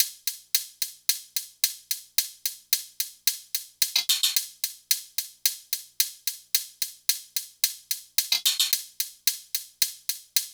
hat 110, Drum Loops, Hat Loops; SD MIDNIGHT Sample Pack; Slate

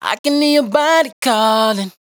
Dry Vocals hook Body Calling Am; Raw Vocals; Slate Digital Omnivox Vocal Sample Pack

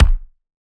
kick punk, Drum One Shots; SD MIDNIGHT Sample Pack; Slate

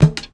perc, Drums, One Shots; Percs; knot; Slate; SD AURORA Sample Pack

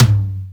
Tom Bongy, 909 Toms; Slate; Vintage Drum Machines Volume 3 909 Edition

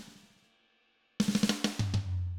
Cumbia Fill

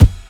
kick bottle; Drums; One Shots; Slate; SD AURORA Sample Pack

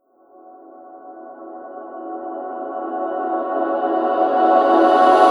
reverse, vocal sample

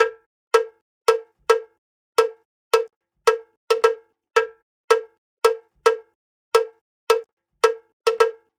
cowbell loop, 110; perc construction loops; SEISMIC Drum Sample Pack; Slate

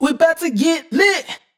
hook Boutto get Lit wet, Vocal; Slate Digital Omnivox Vocal Sample Pack

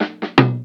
tom aged; Drums; One Shots; Slate; AURORA Sample Pack; Toms

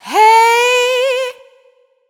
adlib Hey Sung wet, vocals; Slate Digital Omnivox Vocal Sample Pack; Adlibs

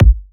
kick one shot, drums; Pop; SD PASADENA Pop Sample Pack; Slate

